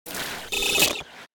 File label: broken robot morphing short static pitch confused glitch; sonniss gdc